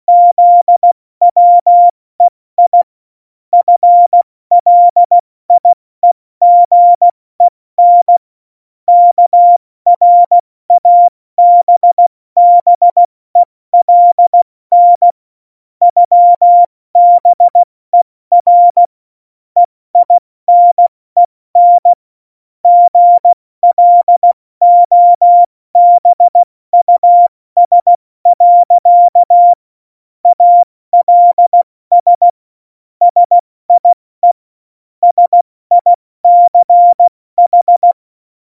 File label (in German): Witz, Morsekurs, 70 F80; Witze